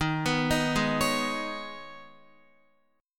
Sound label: Eb 7th Suspended 4th Sharp 5th, Guitar; Eb7sus4#5; arpeggiated; x,6,9,8,8,8; JGuitar